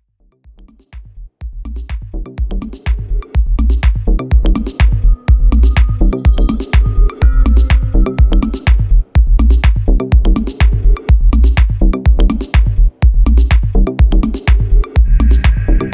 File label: 16 Steps Tech House Minimal